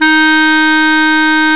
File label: Clarinets